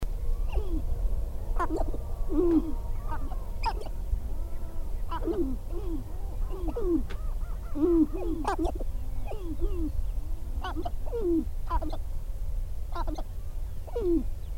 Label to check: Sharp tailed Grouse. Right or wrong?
Right